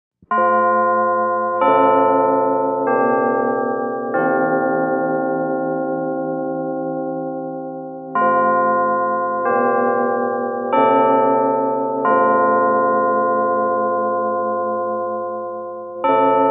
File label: Lg Westminster Clock, bell towers